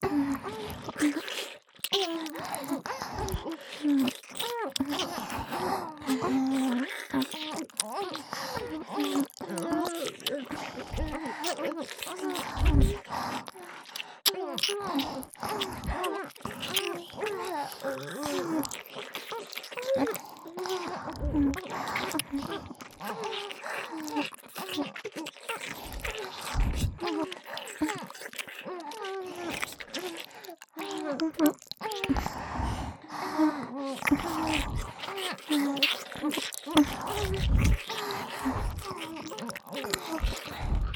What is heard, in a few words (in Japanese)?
Wフェラ＋W耳舐め, ループ